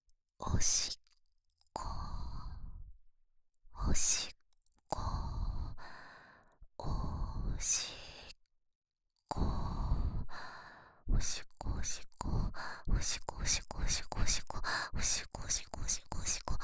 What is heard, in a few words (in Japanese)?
おしっこ, ASMR